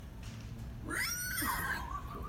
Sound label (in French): sanglier flou